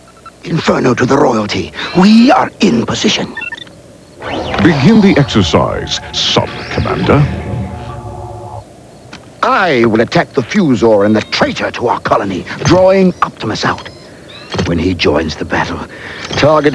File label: attack drill